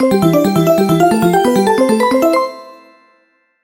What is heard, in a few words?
single reel win